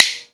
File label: PING PONG; AKAI S6000 CD-ROM - Volume 1; SPORTS